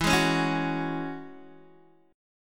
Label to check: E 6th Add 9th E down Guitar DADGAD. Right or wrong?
Right